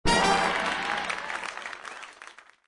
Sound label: double up positive feedback; slots; Sushi Suprise